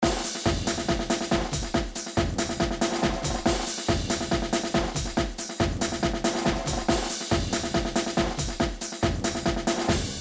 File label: battle; GrandFantasia